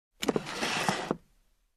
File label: Sliding object